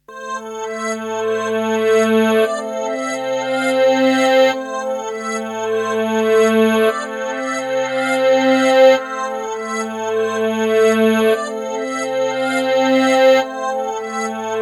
MELODY; Chillout; Future Music

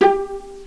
stri pizz

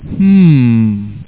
hmm; Software Vault - The Gold Collection (American Databankers)

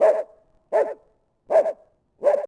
BARK; DAoC; Toa

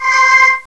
CHOIR; Battle of Britain

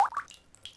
plip; ambient

